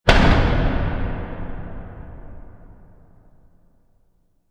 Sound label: hit heavy metal hard reverb cinematic